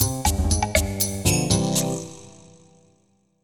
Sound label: end of level; skater